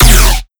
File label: Kick